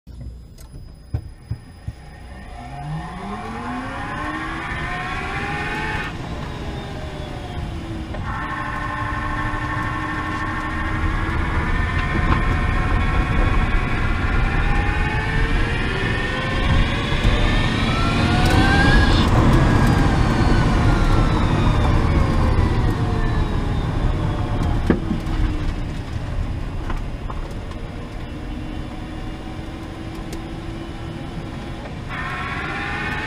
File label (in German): Zoe Motor Lenz defekt